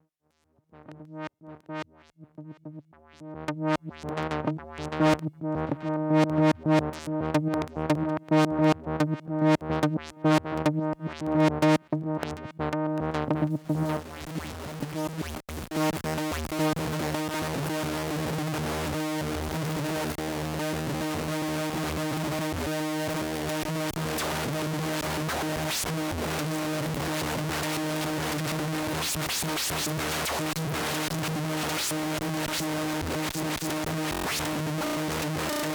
Synthesizer; Ottobit

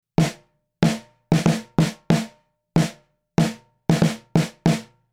Snare Beyer201 NUKE Drive6 Att 8ms 1 Release

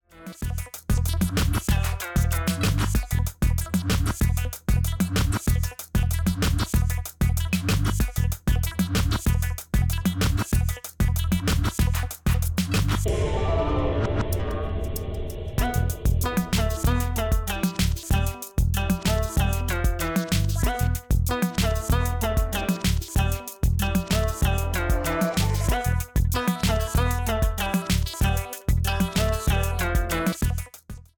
Precise Depth; Waves Online Mastering Service

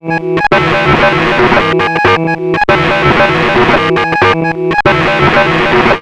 SOMA ROAT Malfunction

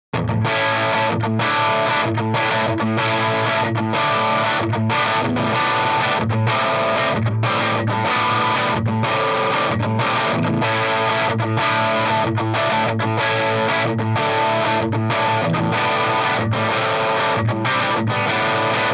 335 rock

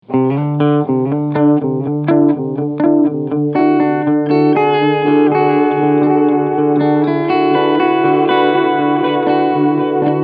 Mag Echo

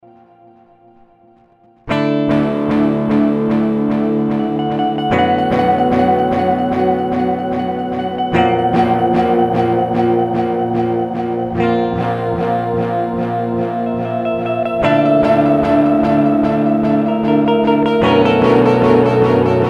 Boss DD 200 Lofi

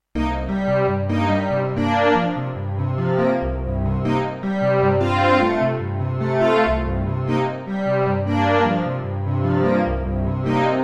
Synclavier Low Vio; NED

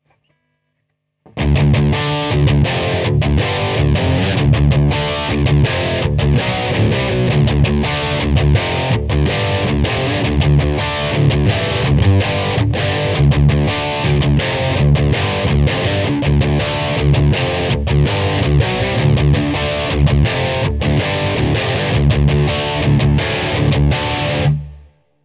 Heavy Bridge

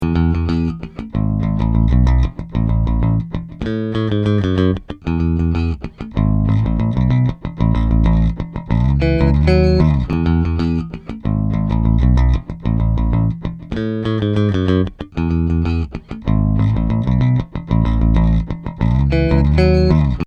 pick

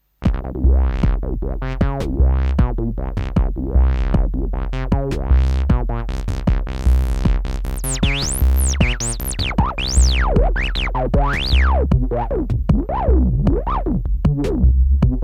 Volca Bass test

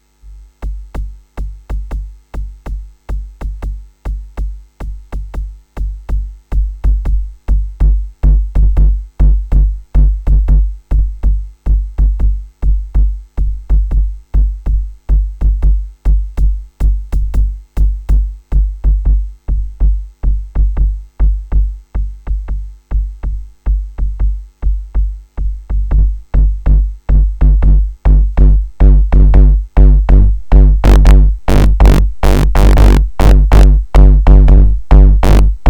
Tascam 688 MIDI Studio

